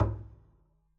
pizz noise low thump, double bass; dsmolken; noises

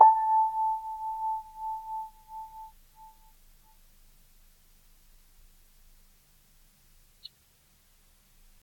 Grand Piano, Kawai, Sustains, A4; Chordophones; Legacy

